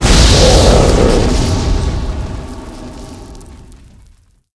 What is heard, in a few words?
treeboss dead, monster